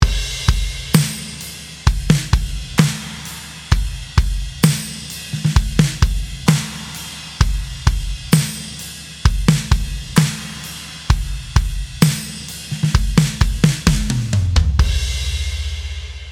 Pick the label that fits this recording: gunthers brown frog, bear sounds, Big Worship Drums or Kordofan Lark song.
Big Worship Drums